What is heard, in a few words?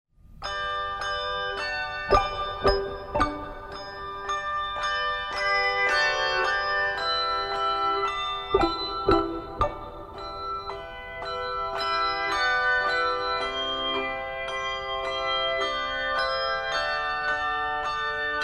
Handbells, Handbell Music; Praise To The Lord; Publisher: Jubilate Music